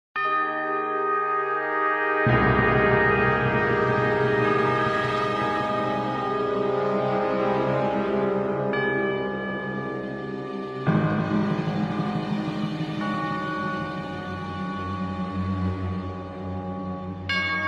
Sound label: Mystery Ambiance Intro; Alice: Madness Returns unreleased original soundtrack